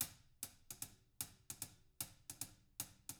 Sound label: HIHAT LOOPS, Living Drums